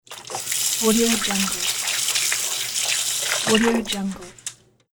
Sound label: rinsing dish